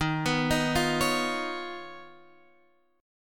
D sharp 7th Suspended 2nd Sharp 5th D sharp, Guitar, Half Step Down; D#7sus2#5